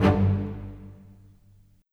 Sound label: Bass, Cello Viola, Orchestral; Sonic Foundry (Sony Creative Software); Orchestral VOL-2; Modern 10